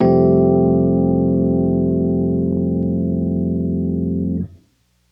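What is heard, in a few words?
Rhodes F major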